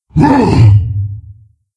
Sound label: Wound, Creature; Steelbreaker